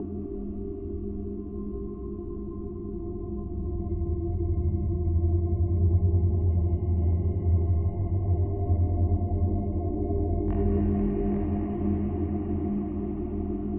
textura

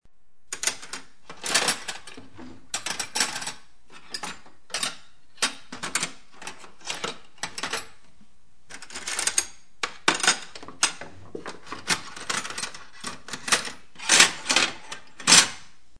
Cubiertos

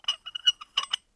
tornillo oxidado

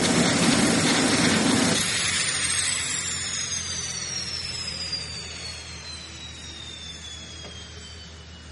avion

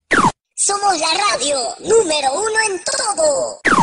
para radio

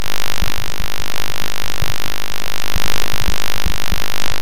seco

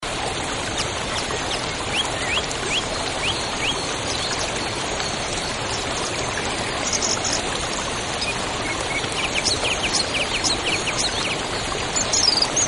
aves

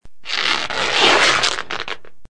Camisa desgarrado

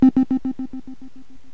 pitidos

